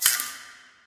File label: light flicker; Source Engine; Portal 2